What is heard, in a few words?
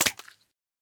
flop, pufferfish; minecraft 1.21.4